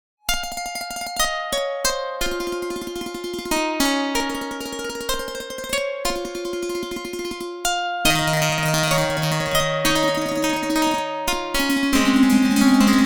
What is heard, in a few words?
harptime free run, synthesizers